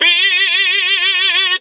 bee, Voice

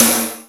xiled, Snares Electro

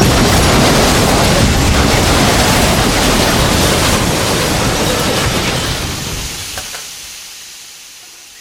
train crash

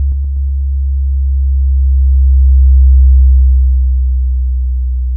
deep tone, Kicks

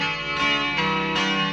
guitar, Loops Phonky

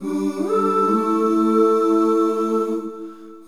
voice, Sad

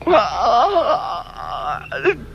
whining; ooh ahh; human

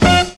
power, stabs

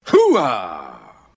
"hoo ahh", voice; ooh ahh; al pacino

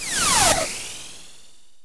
missile, zaps and beeps

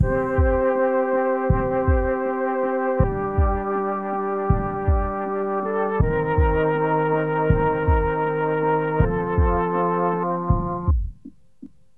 keys, Loops, Sad

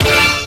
electric, Stabs

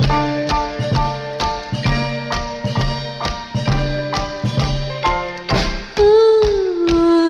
piano, loops, phonky

